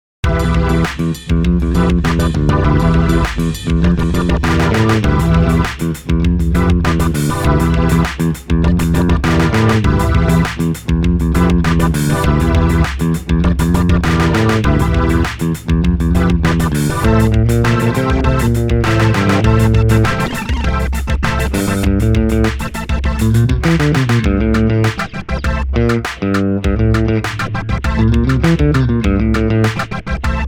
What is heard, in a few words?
spooky glasses funk